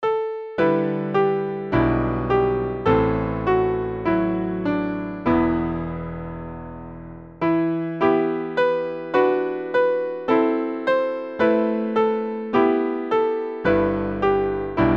Hymn; Hymnal 1982

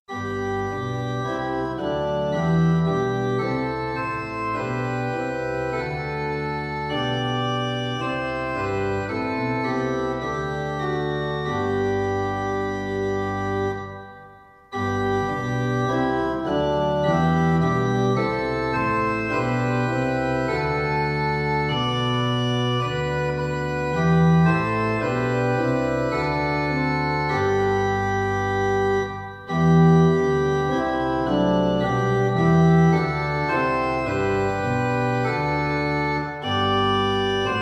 Bread Of The World In Mercy Broken Organ 2 Verses; Rendez A Dieu; Hymnal 1982

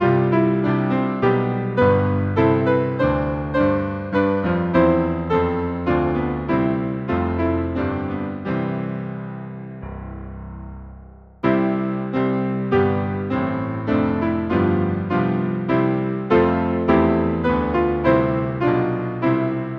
Hymn, piano; May the grace of Christ our Savior; Halton Holgate

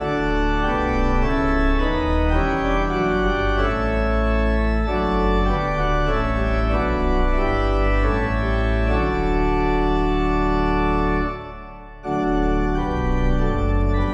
organ, hymn; song 13; Hymnal 1982

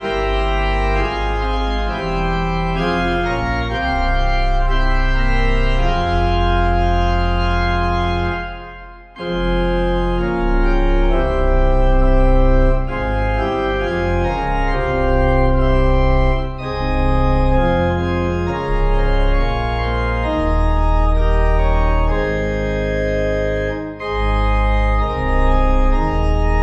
organ, hymn; schonster herr jesu; Hymnal 1982

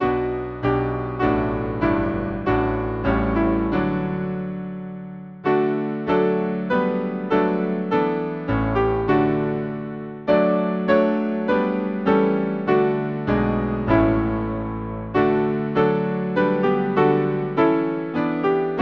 Hymn, piano, Hollingside; Take my life and let it be

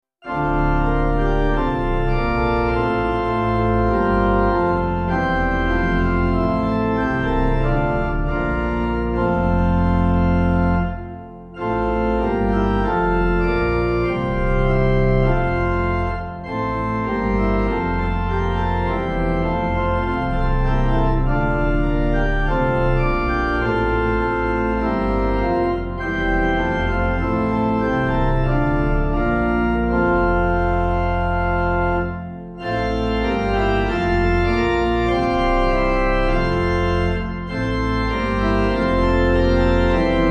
Hymn organ; Love came down at Christmas; Gartan

